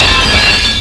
Smash Crock; Casady & Greene; Crystal Crazy Demo; Smash the objects

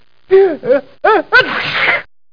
sneeze

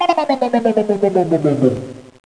lose; Carom

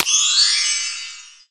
Click Combo; Pirates Of Treasure Island

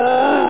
battle; CursedKingdoms

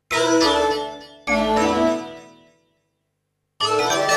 Synt; FUNKACID1

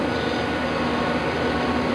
Machine Loop; Teach Your Children: Road Construction Ahead

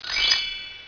Bells Magic; The Complete Web Studio 2.0

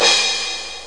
CYMBAL; Leonardo The Inventor; Broderbund; Riverdeep

